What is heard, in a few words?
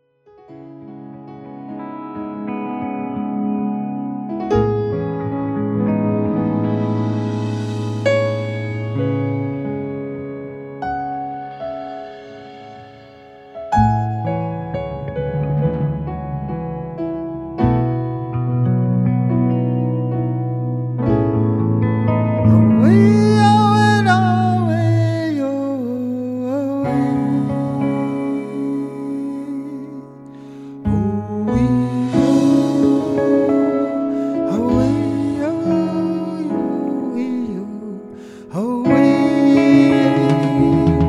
Wind Song; Demo